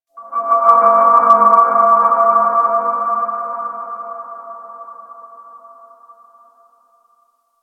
Hub Hangar Satellite One Off; Warframe; Ambience; Tenno

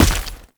Heavy Step Dirt, Footsteps; Enemies; Foley; Warframe; Tau Dax

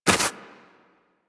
Armadillo Dig Snow; Warframe; Hunting Critters; Foley

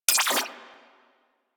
Revolver Pistol Shot; Prime Revolver

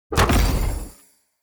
Thorn Bow Charge Shot; Weapons; Player; Bows; Fey Thorn Bow

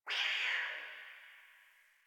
Distant Cat Screech; Warframe; Ambience; Nineteen Ninety Nine

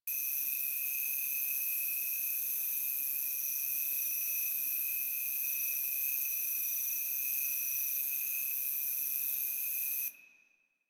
night insects high loop; Ambience; Props; Loops; Warframe; Eidolon Plains

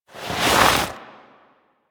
Arm Lift Foley; The Second Dream; Stalker

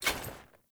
Foley Action Armour Run; Characters; Player; Deora Armour

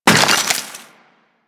Melee Impact Wood; Warframe; Weapons

